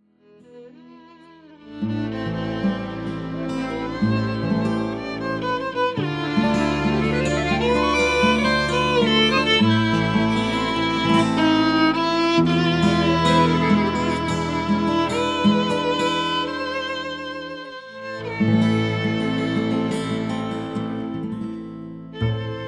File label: Trio CZARDAS; Les Yeux Noirs